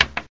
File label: spin